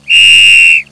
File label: whistle